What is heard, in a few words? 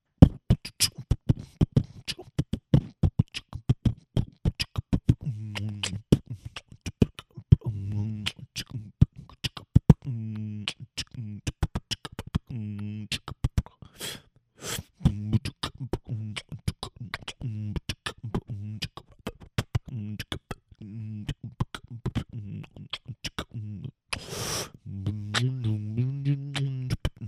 Vocal beatbox and doowahs